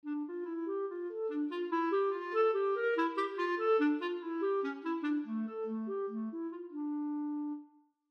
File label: Clarinet; examples in treble clef